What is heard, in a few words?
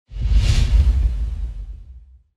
sub woosh